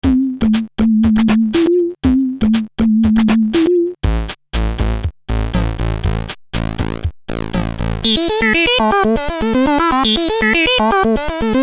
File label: teenage engineering pocket operator 28 robot